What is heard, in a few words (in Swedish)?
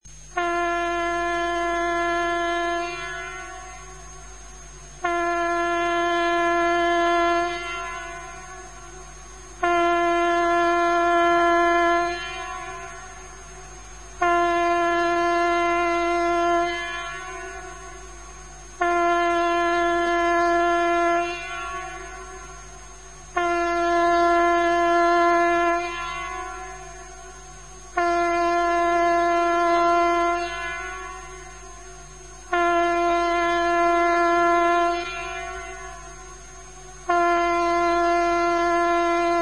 flyglarm tyfon